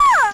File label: "ah" pain, Voice ooh ahh